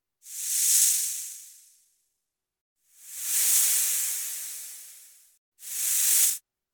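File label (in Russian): Zmeya shipit kobra, snake hissing, cobra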